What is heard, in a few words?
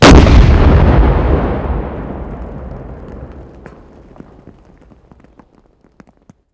Vehicle Weapons